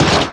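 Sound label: EXPLOSION; AKAI S-Series CD-ROM Sound Library VOL-8; SFX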